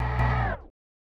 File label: Industrial 160